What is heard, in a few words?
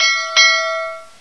gong